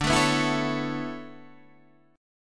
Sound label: B Minor 11th D